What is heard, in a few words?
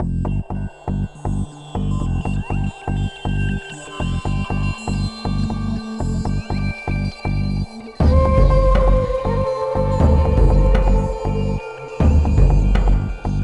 Music Security Override; Space Camp; NDS